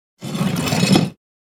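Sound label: Bolt lowered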